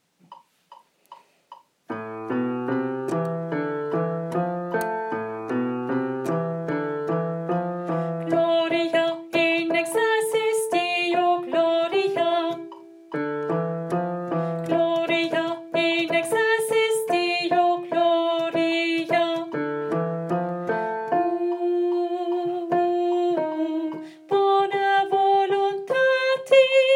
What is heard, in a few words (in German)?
Gloria, Alt II; Alt II (Teil 1); Messe; Chor